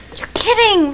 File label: error; spin city